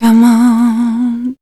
voice spectral, ly short

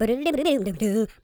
SCAT, Jazz

